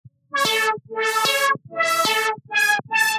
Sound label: 150 kit loop synth main lead filter lfo Dm; skreem